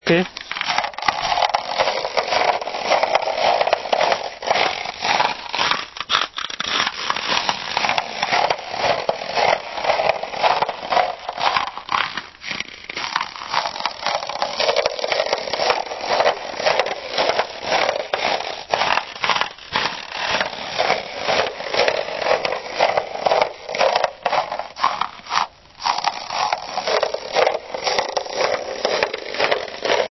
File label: snowwalk